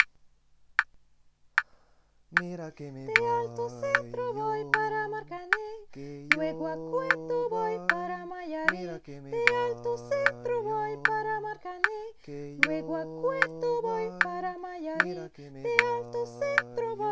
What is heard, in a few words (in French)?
basse soprano, choeur